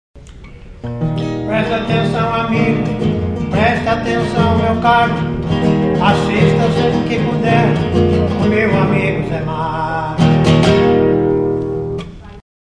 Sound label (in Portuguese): vinheta cantada; ary toledo